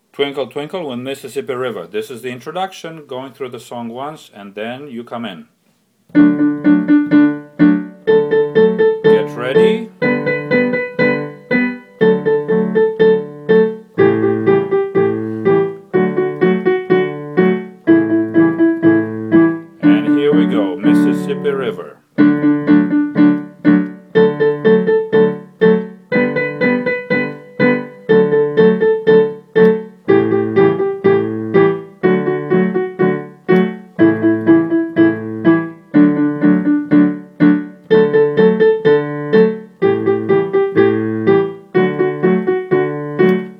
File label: mississippi river twinkle, strings